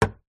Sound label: foley plastic bin lid hit with metal stick